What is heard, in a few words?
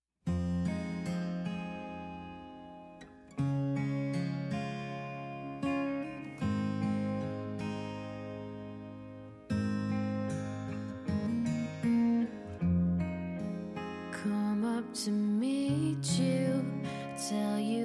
intro music